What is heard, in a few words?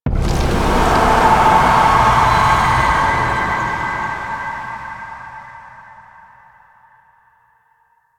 Hex Appear; gameplay; Warframe; Nineteen Ninety Nine; Stage 11 Future Past